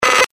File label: noise